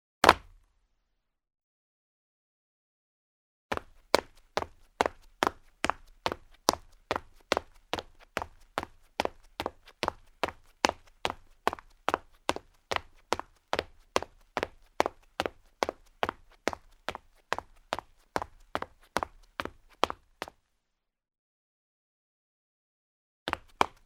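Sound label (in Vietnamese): Tiếng bước chân đi nhanh rồi chạy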